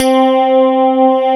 CLAV, FUNK FM CLAV

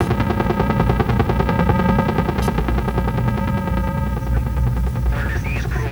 Kaos FX, Ambient Grooves

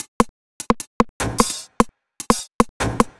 150 Breaks Perc, Drum Loops; Zero-G; Soundclash Nu Dance Electro; Acidized Wavs; 150bpm; Breaks 4 Love

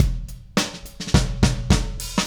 Beat Fill, Indie Rock; Sonic Foundry (Sony Creative Software); Indie Rock Anthems

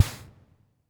Snare Reverb, Vermona DRM1-MK2

